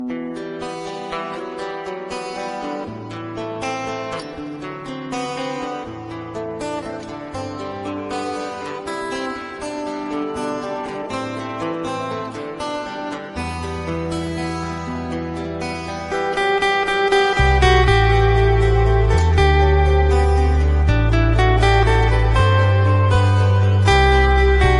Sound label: Music